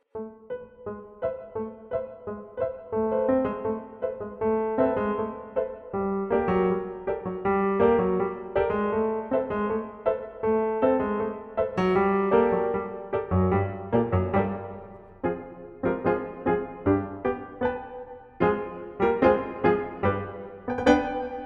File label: Dark Hallway, Piano